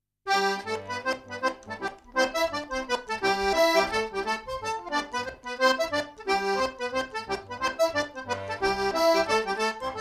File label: Step Dance Tune The Sailors Hornpipe; Oscar Woods